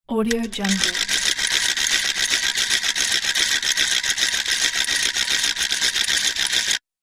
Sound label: toy robot mechanism